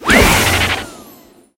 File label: bluebell